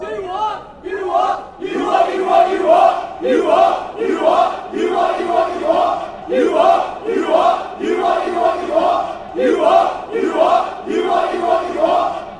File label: you what fanchants